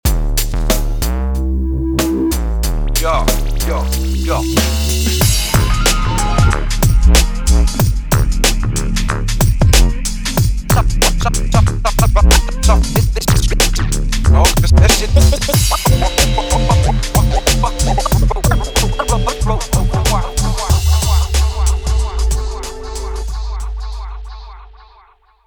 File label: bass hip hop; bass master